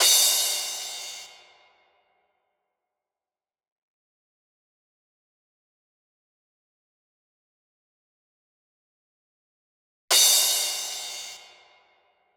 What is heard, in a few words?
drum track crash, Smooth RnB, 095 Emin